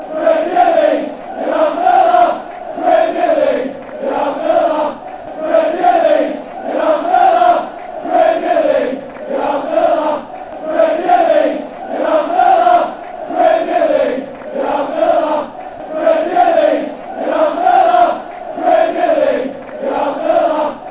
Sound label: premier league you're having laugh, chants